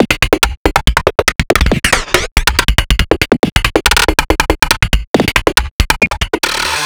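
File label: rhythm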